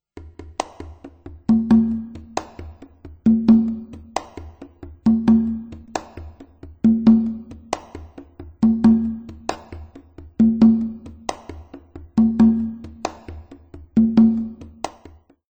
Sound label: congas